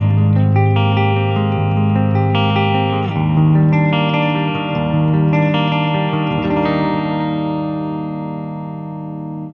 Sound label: 7 String Clean Guitar Arp Loopable